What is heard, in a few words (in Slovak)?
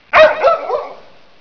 pes; zvierata; domace